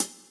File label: Hi hat close